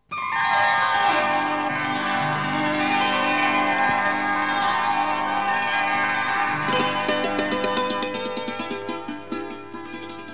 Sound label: Theme Instrumental; Anuraag